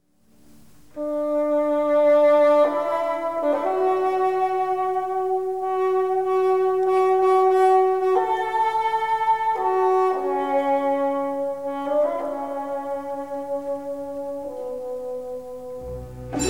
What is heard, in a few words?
Orchestral Bassoon; Ravel Alborada; Orchestre de Paris; Martinson